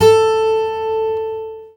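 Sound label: GUI Ac. A2, acoustic guitar; Best Service Gigapack 2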